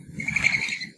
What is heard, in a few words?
parrot